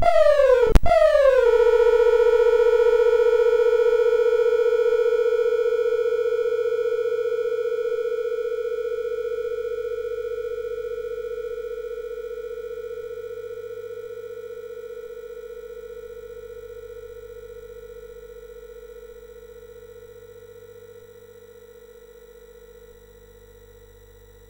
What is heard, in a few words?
sid MOS6581R3; Ludo BASIC